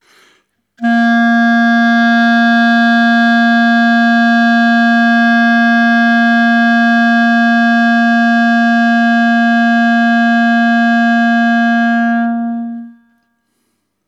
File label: Clarinet tuning C